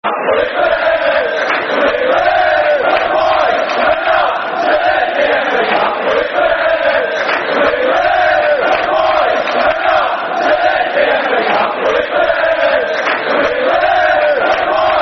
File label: We Were There, Football Chants (Soccer Song); Chelsea Cfc